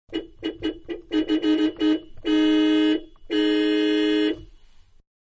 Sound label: Mid Size Car Horn Several Interior; Cars (Sound Effects:Vehicles); Manhattan Production Music